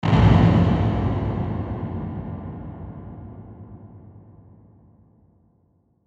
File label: Hit Ring Reverb Dark; Blastwave